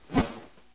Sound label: swing; hb6demo